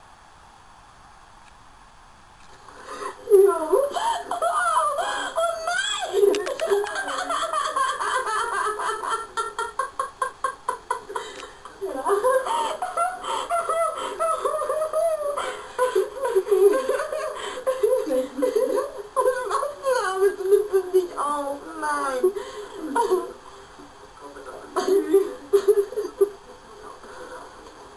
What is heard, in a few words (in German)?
lacht